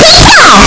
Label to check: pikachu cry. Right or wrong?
Right